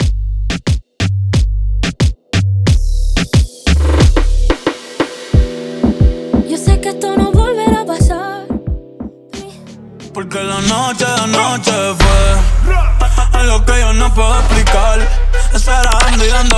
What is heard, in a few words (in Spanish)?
Acapella Hype